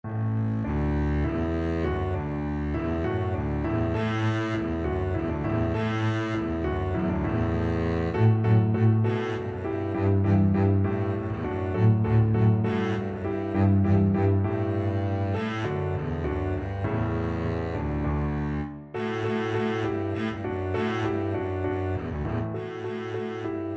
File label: double bass